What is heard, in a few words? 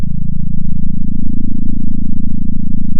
center, sound of a small motorcycle